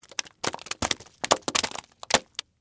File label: Splat Debris Juicy, Long; Texture; Splats (Sound Effects:Objects); BlastWave